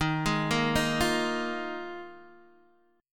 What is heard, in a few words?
D sharp Suspended 2nd Suspended 4th D sharp Guitar DADGAD